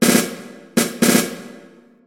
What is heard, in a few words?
Si rythm